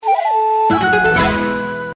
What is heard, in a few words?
medium win; gonzosquest